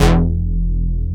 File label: PROPHET5 SYN, SYNTH; East West - Global Sounds; USA SYNTH